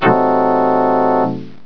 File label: organ